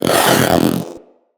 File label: Companion Vocal Attack Melee, Pets; Zanuka